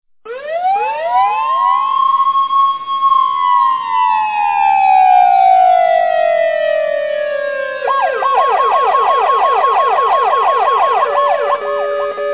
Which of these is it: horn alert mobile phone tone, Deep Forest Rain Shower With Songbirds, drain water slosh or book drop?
horn alert mobile phone tone